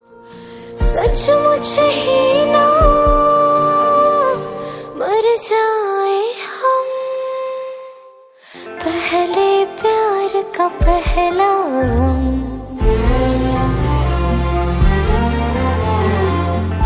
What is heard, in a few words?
hindi song ringtone